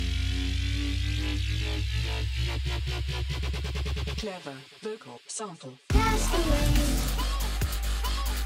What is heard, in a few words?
dubstep